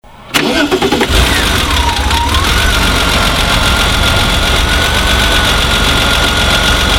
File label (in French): 964 demarrage, Porsche